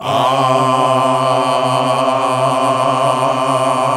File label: GOSP. AAAHH